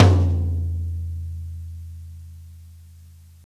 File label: lo TOM hard, Drum Kits; Big Fish Audio - DOM; Redrum